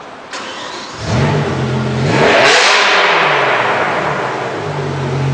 Bmw M5 Engine Start

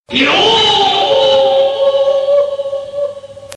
Funny Msg Tone

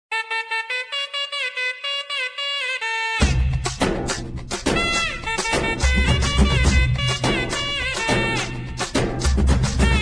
Cool Folk Ringtone

